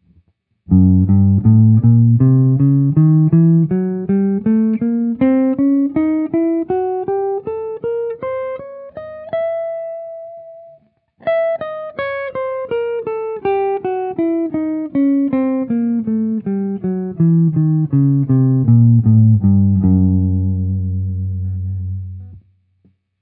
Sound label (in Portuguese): scale gb, escala diminuta dominante semitom tom